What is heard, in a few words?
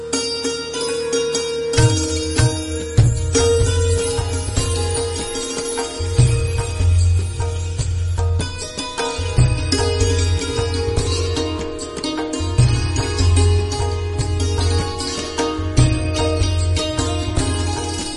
INSTRUMENTAL RINGTONE, BHAKTI